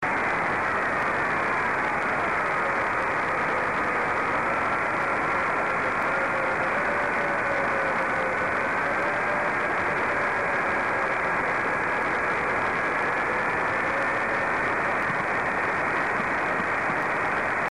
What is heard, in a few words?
ISS